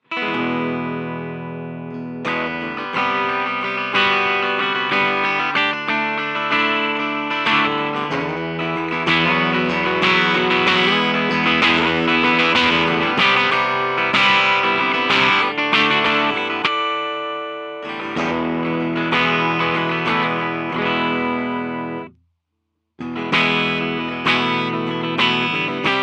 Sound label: clean